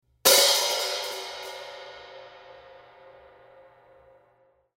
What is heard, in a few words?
14 K Custom HiHats Open Hit, cymbal